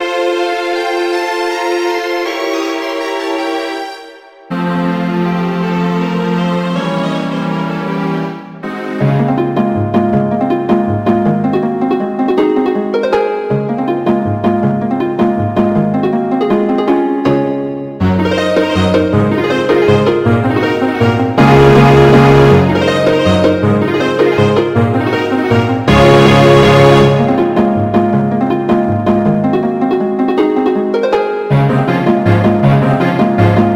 String Accompaniment for Suite of Eight Dances Seguidilla